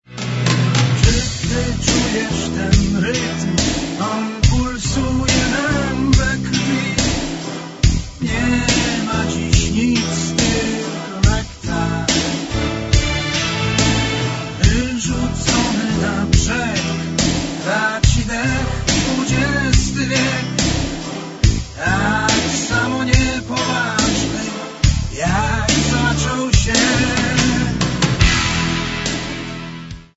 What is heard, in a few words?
ragtime